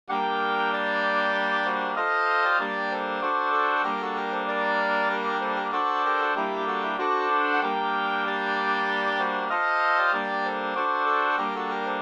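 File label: polska, three-part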